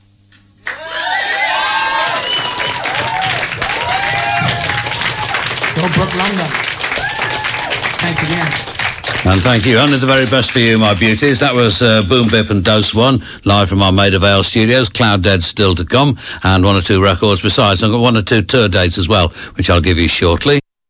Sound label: Audience